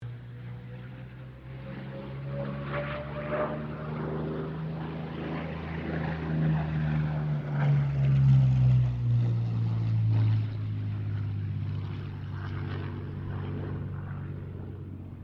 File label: AIRCRAFT P-40 KITTYHAWK PASS STEREO